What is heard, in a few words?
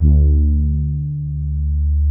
soft brass, poly soft D2; Music Store - Claudius Diemeter Schwerlos